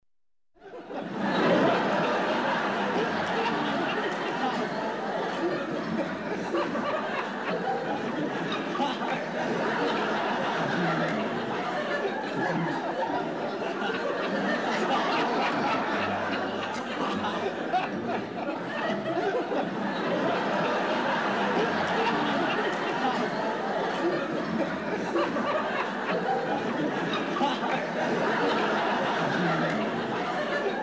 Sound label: LAUGHTER, CROWD - MEDIUM CROWD, LARGE ROOM; Sound Ideas